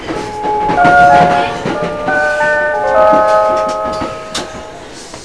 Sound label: chime; JR西日本681系量産車のチャイム; 「サンダーバード」に使われている681系のチャイムです。始発駅を発車した後の放送を入れる前に鳴らしてくれます。